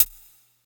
Clicky Hihat Sound A Key, pedal hi-hat single hit tuned to the A note